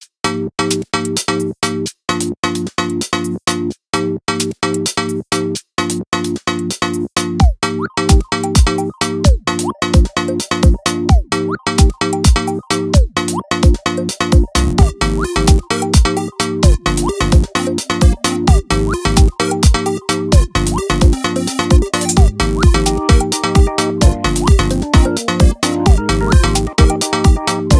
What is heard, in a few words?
Rain line - Instrumental